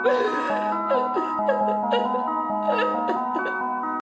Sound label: weeping